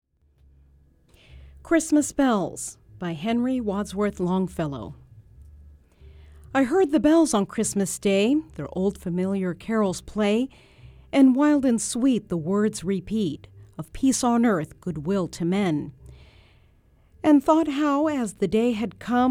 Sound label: Christmas Bells